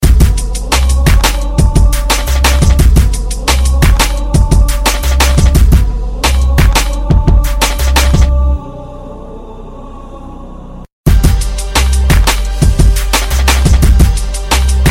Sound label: Hiphop; Halo